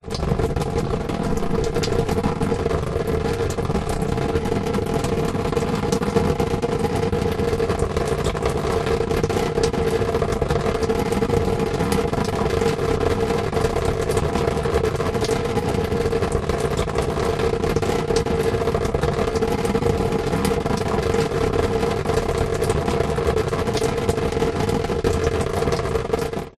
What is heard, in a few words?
foley plastic tub upside down water trickle drips recorded inside